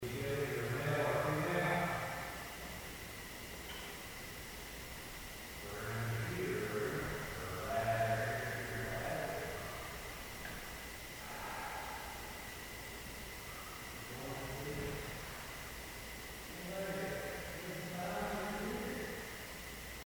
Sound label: Third Floor Unexplained breath; Case 024 Pocahontas Fuel Building II